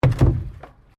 PLASTIC BARRICADE IMPACT; Material components for constructing more complex sounds

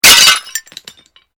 GLASS SHARD SMASH

